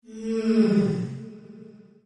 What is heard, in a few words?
Ghost; yet another pixel dungeon android